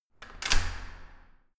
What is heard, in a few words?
entrance door close normal